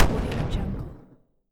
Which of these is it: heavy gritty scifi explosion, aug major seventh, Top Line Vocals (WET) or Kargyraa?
heavy gritty scifi explosion